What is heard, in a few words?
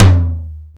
Tom Drop